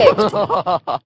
weirdo laugh, male; MISC and SPOKEN WORD; VOCALS and SPOKEN WORD

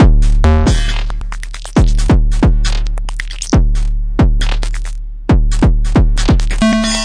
glitchy funk, drum loops and breaks

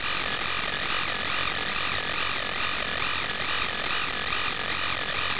alien busy signal; Various Strange Sounds and Effects

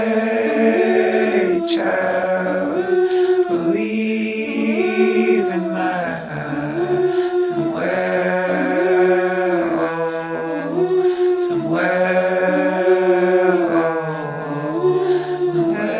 120 Vocals; remixable collections; Folksy Guitar and Voice Stems

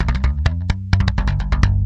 128 fast rolling hammerbass up down, bass loops

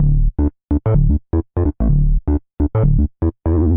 square wobble bass; BASS LOOPS

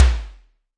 noise tom; Western and Latin Percussion

